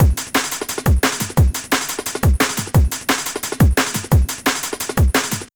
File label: D&B Drum Loop; neuro